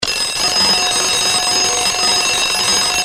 电铃; 钟声; 广播室铃声系统

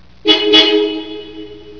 喇叭

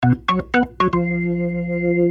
风琴

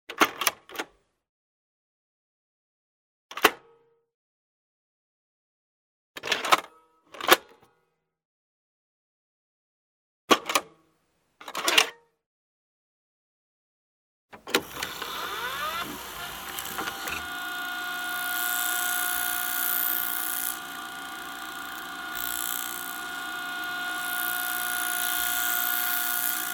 五花八门

